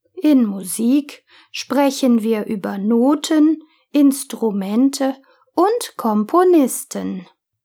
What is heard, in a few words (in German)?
musik